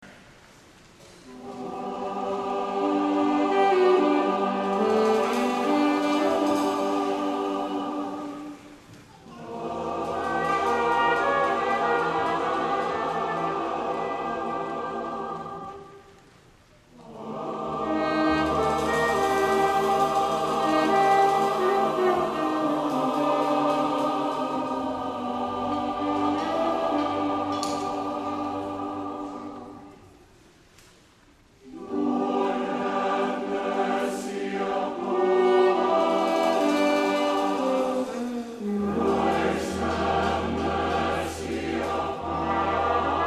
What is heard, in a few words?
Kyrie (live recording); jazzmass